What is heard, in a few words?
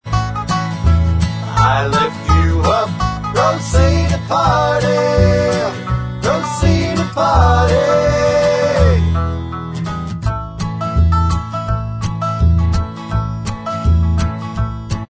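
backing vocals track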